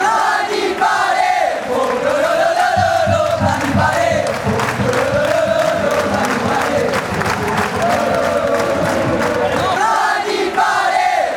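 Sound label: fanchants, football chants; dani parejo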